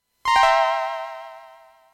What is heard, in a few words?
Sky Blip